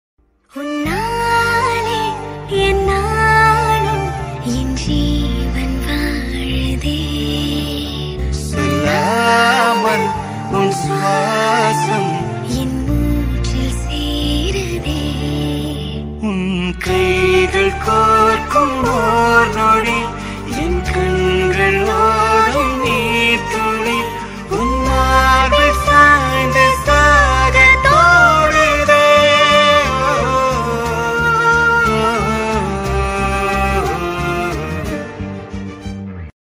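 song ringtone tamil trending background music ringtone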